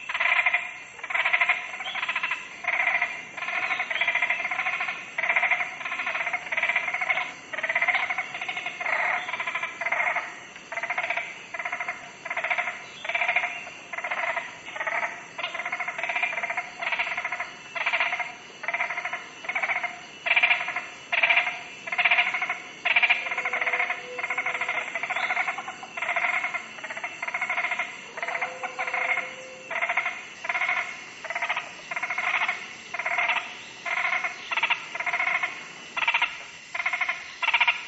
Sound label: skwirls